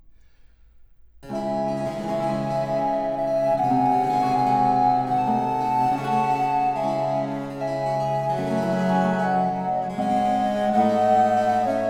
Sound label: Sonata Terza in D Minor for two Recorders Largo